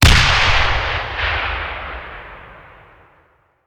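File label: Artillery Grenade Launcher; Sound Ideas; Guns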